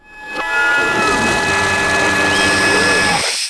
ship arms close; Earth and Beyond; EA Games

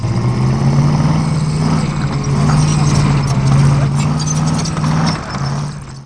movement

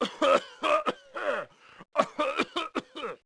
Male coughing; Sierra On-Line; SWAT2DEMO

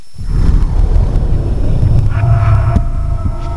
menu popup; 5th element

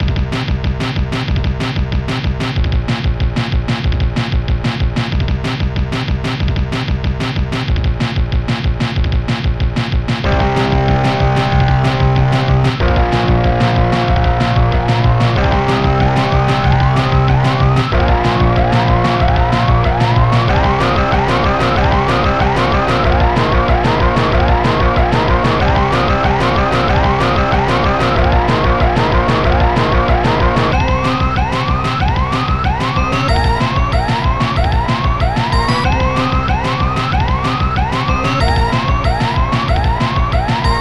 DEATH; Software Vault - The Gold Collection (American Databankers)

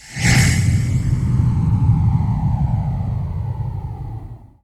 fly by

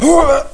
GRUNT; PC Gamer IT CD 38; Requiem

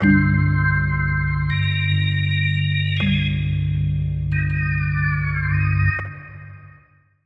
SYNTH D+B 160 C, Drum+Bass Keys; eJay

